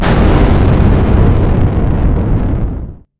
big explosion

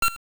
move

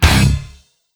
hit

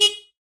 bike horn; zapsplat

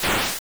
hurt

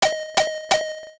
warning

